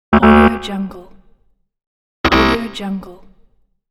error

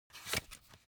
foley paper magazine flip back soft; smartsoundfx

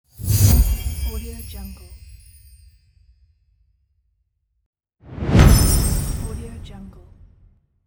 magic hit whoosh; welltone

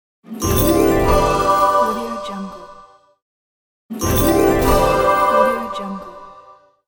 level up

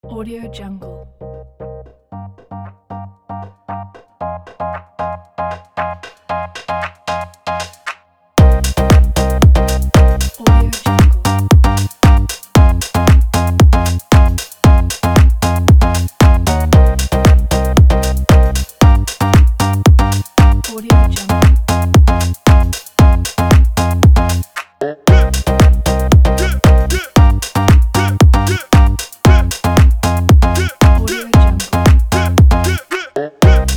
event fashion house

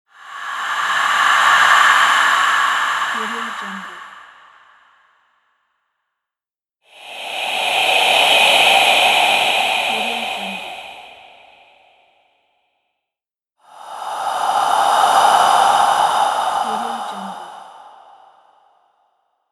ghosts breath

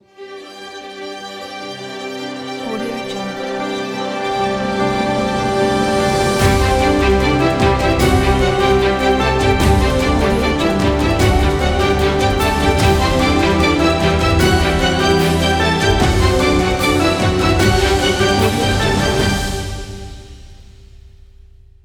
award fanfare opener logo

